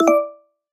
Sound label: notification